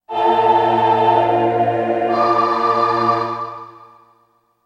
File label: Baton; The Wind Waker